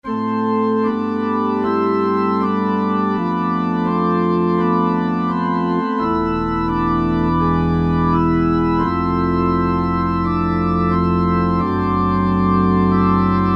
Pipeorgan1, Korg T3

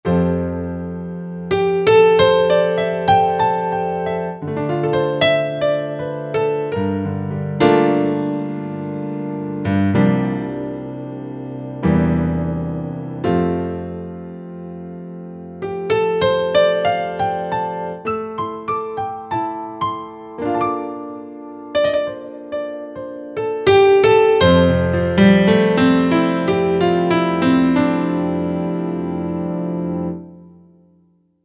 Acoustic Piano, Korg TR-Rack

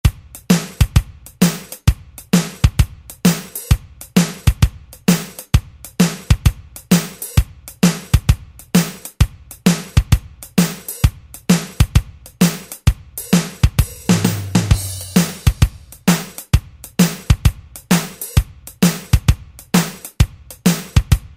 Country, Alesis SR-16; Preset Patterns

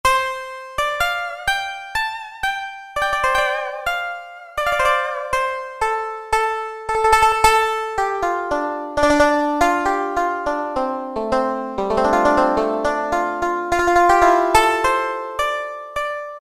Koto; Roland PN-D50-02

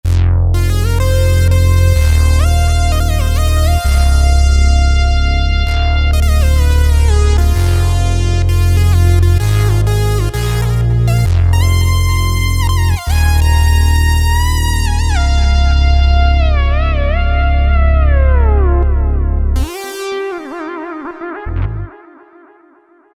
Dual Mini's, Roland JP-8000; Factory Performances

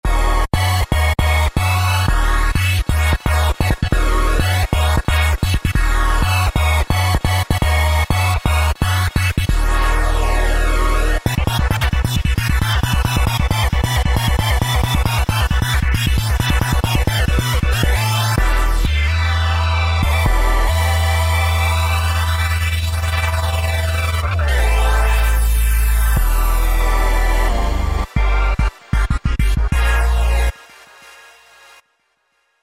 Jet Bass, Roland V-Synth; Factory Patches

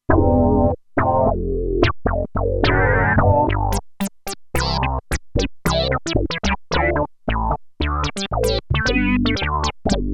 Wet Syn; Factory 2

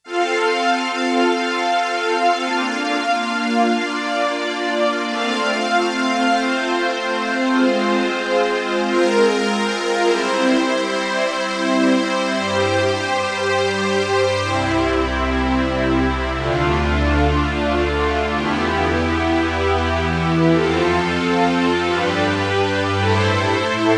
SYN STRINGS, Roland JX-1; Factory presets

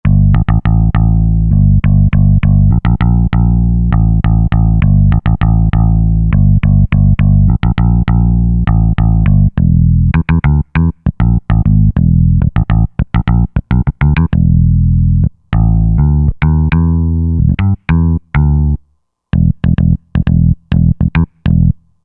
Pick Bass, Roland JD-990; Factory Patches

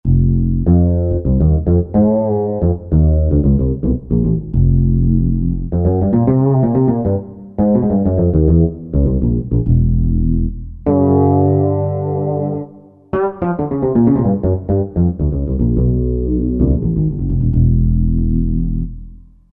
Sweet Fretless, Korg Wavestation A-D

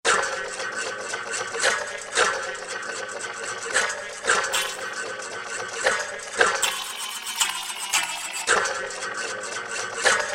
SPIN BIWA, Roland SC-880; Factory Patches

